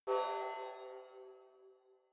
sci-fi facility ai awake damaged severe, signale; soundpool

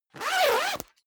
zipper down jacket open fast; objekte; reissverschluss

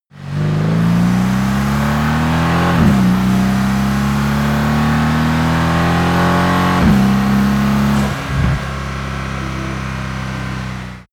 car mercedes amg c63 s fast accelerating

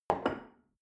shell upside down place on table soft; berufe; gastronomie; soundpool

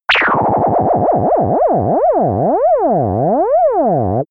oscillating energy loss; pulsieren

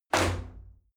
shower slide door open hit heavy

